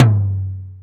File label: TOM MID; Greytsounds; Scott Peers Sound Engineering